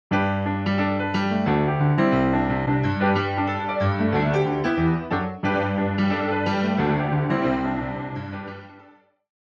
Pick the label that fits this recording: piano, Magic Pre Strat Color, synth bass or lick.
piano